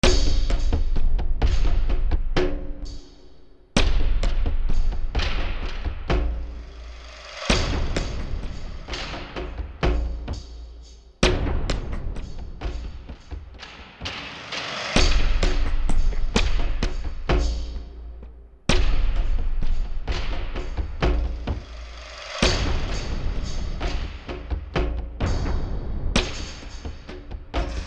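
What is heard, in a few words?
Battle Loop Rural Percussion; Fallout New Vegas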